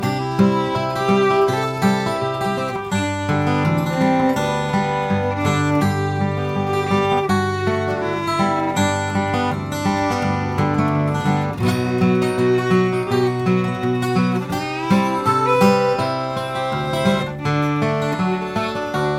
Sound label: Spanish Fandango